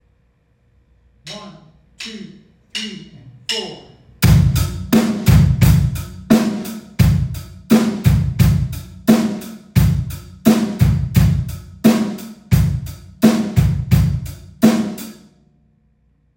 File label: eighth kick, drums